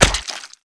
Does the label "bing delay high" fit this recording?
No